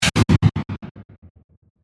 Chopper Bright, Production, Element, Chopper, Bright, Whoosh; SoundEffects-BlastWave